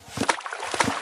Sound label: pipe show bar, Water